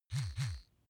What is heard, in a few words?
razor smooth double